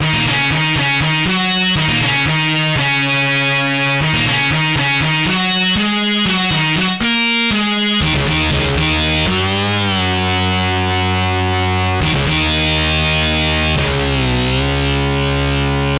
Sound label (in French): Guit elec Jeu; MOBICLIC 14